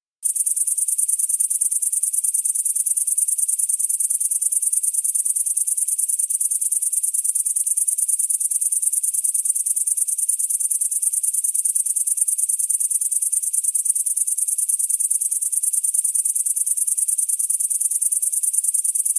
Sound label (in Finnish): lehtohepokatti yö